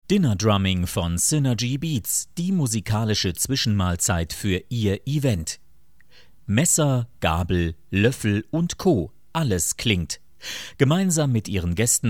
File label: dinner drumming